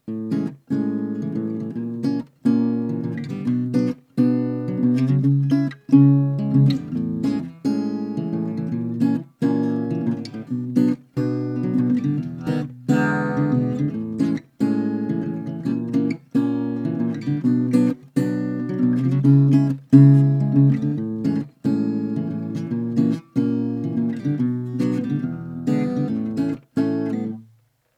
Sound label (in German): akoustik ohne